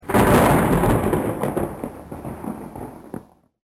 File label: thunder